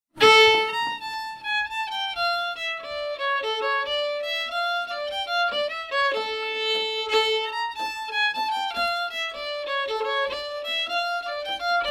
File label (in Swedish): Finnskogspols i moll